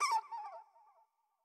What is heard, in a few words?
Bat Death